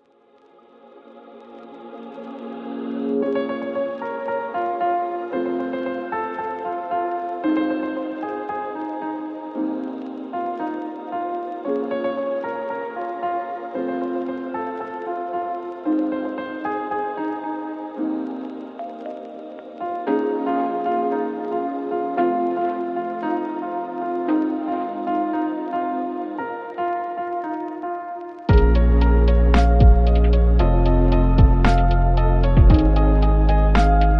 instrumental lofi

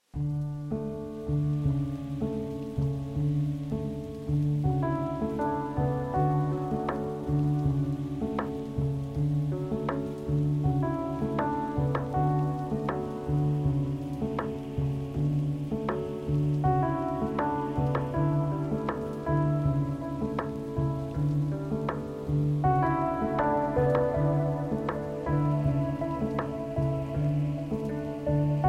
lofi instrumental

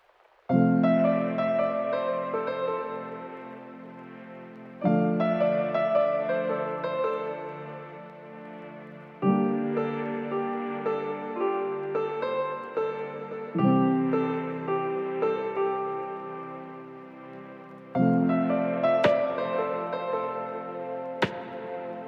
Instrumental Lo-Fi

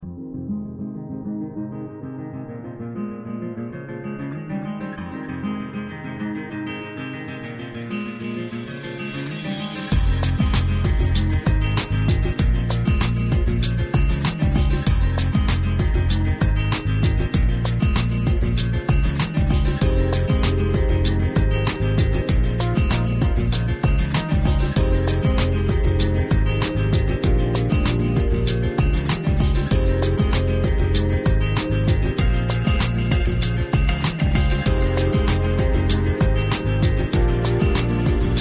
Lounge Guitar; Deep productions; Soundreef Radio

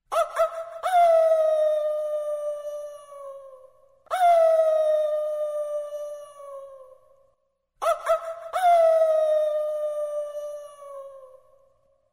Dog Bark Warning; SONIDOS TERROR

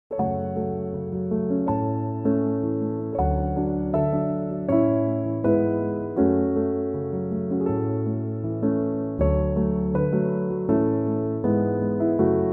piano instrumental

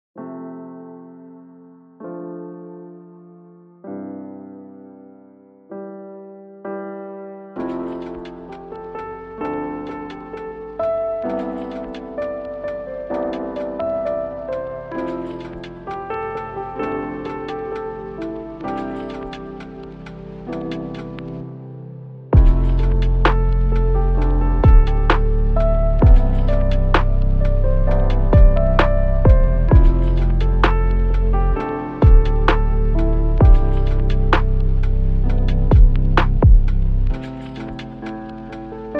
instrumental lofi

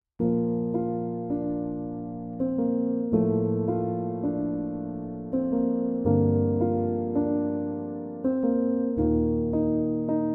piano instrumental

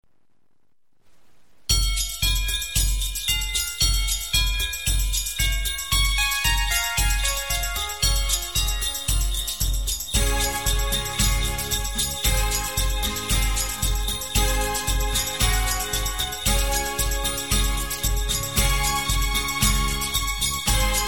Instrumental Christmas; Campana Sobre Campana; navidad ambiental

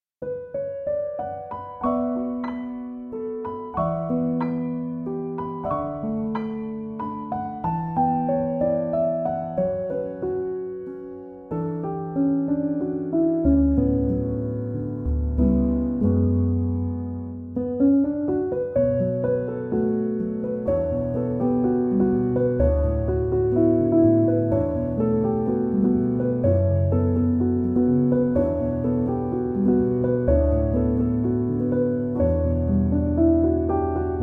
piano instrumental

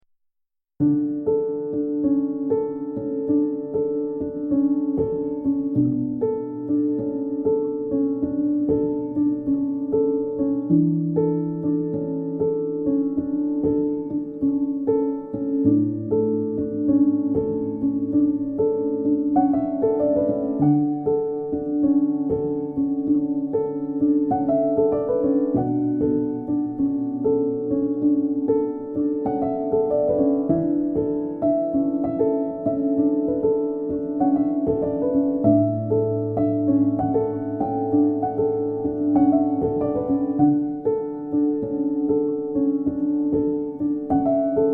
Piano Version

